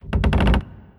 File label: Wood Creaks